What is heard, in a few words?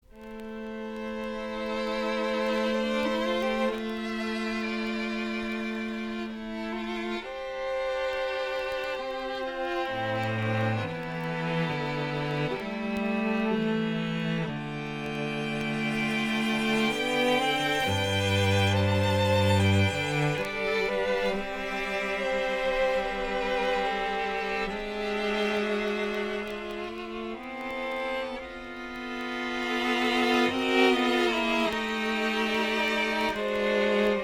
STRING BAND